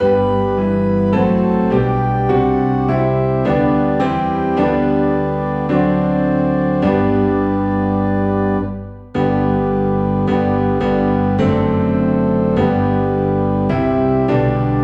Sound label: Piano and Organ Low in G; Spirit of God Descend Upon My Heart